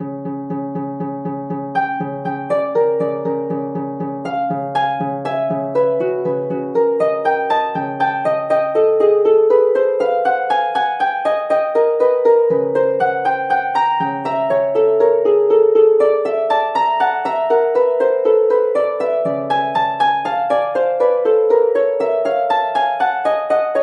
water temperature quad harp

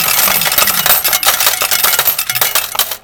amusements coins payout; Weymouth

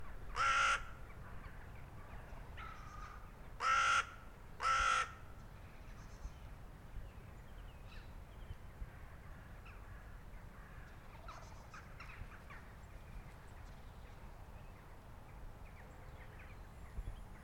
crows crowing in cemetery Kingston London England; Bonner Hill Road

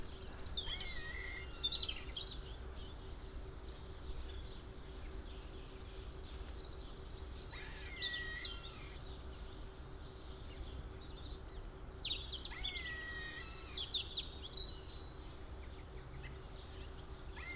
squirrel grey vocalisations and birdsong; Kingston London England; cemetery

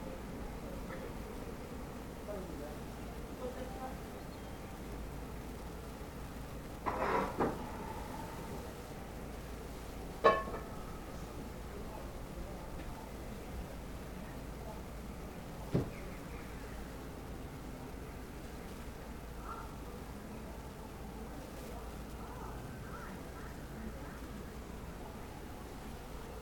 desk dusk ambient

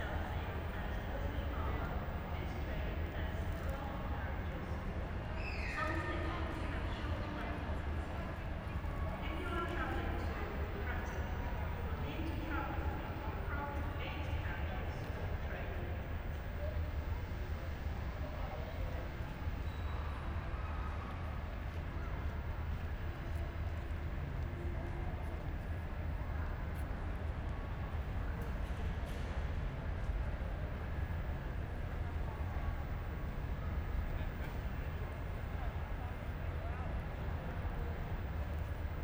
morning Waterloo station concourse

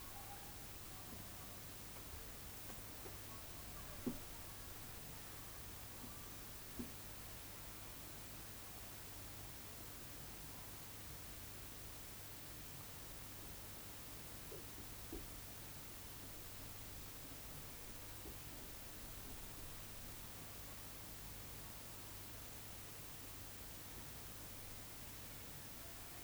desk ambient; AudioMoth; 384ksps

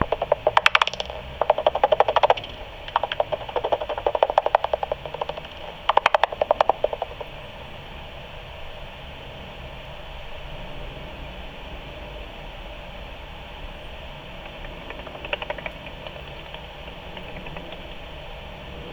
front window common pipistrelle 45kHz; bat

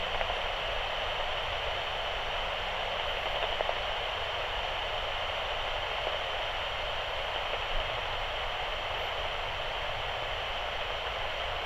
back garden common pipistrelle 45kHz; bat

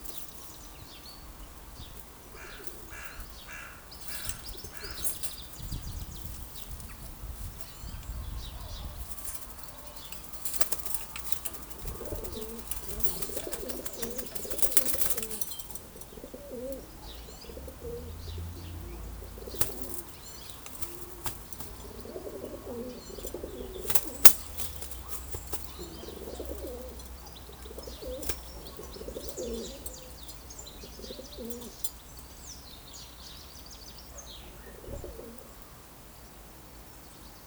garden ambient noon birds on metal feeder

